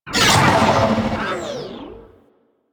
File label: Space Fighter Coil Agent Clone Destroy; Warframe; Enemy Ships; Space Fighter Sentient Coil Agent